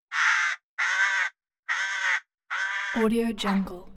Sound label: bird crow